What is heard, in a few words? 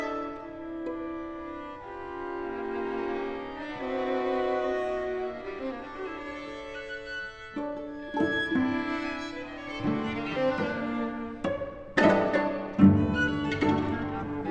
classical